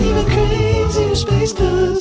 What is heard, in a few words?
Crazier Space Dust